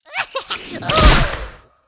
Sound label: poison plant attack, monster